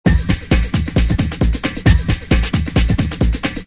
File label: drum loop